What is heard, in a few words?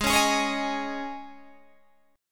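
Ab Suspended 4th chord, Guitar, Drop D tuning; 18,16,18,18,16,16; down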